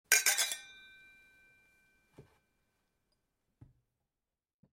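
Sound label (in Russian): звуки чоканья на празднике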